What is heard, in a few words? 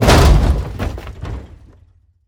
Veh Hit, Damage; DCS